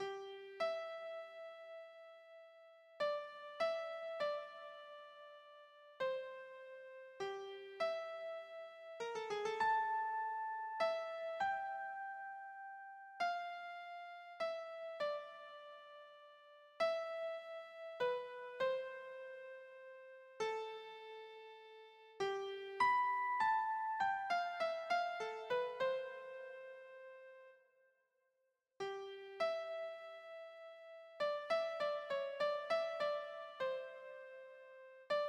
noct, keyboard

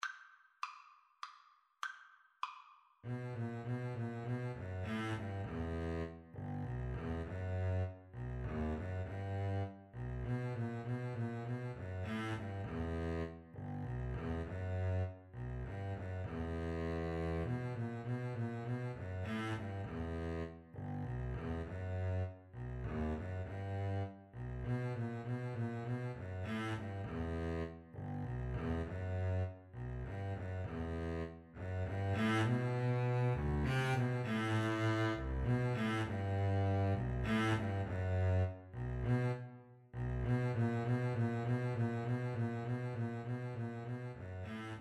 Double Bass Duet

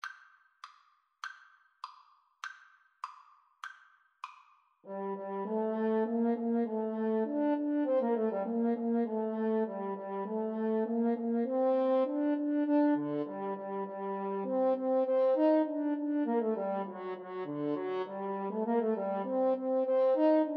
Sivivon, French Horn Bassoon Duet

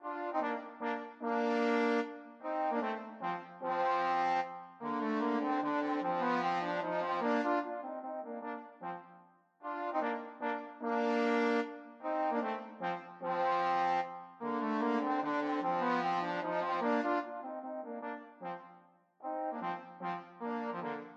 trombone duet

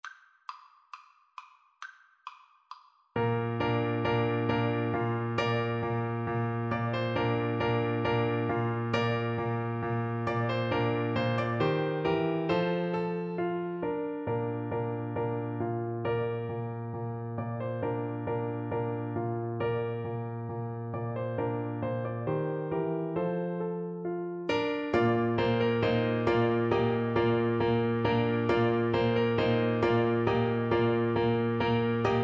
clarinet

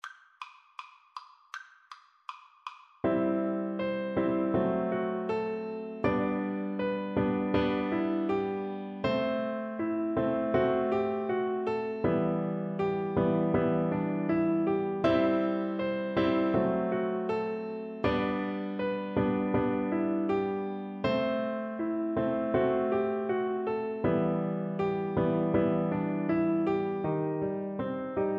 polovtsian, Bassoon

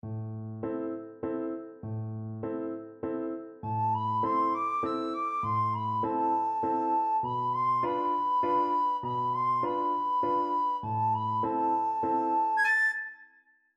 creak crunch scream, recorder

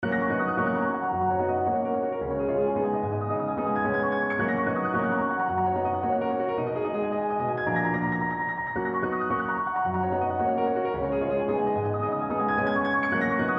Etude, piano

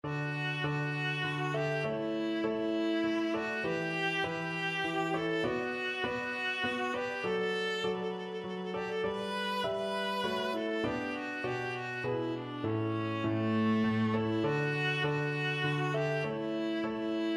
chaconne, viola

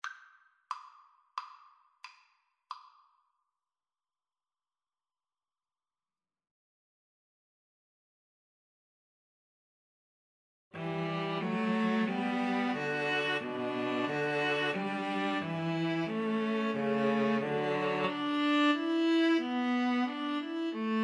trio, string trio

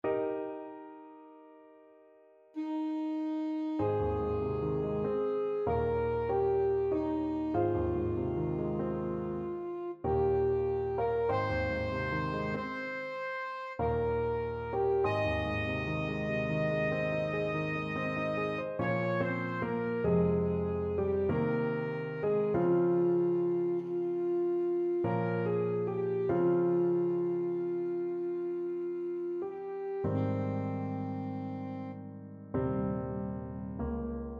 ASAX, saxophone

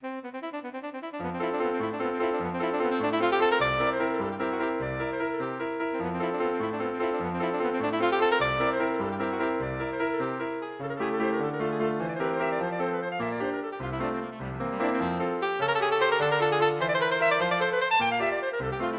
chopin minute, saxophone

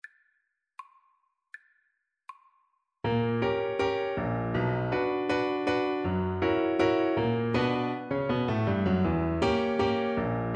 el choclo, Bassoon

